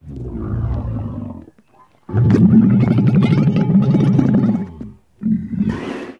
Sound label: Camel Call Grunts; buffalo